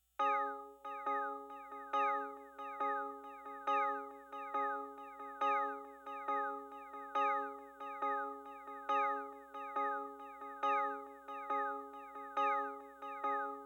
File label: Bells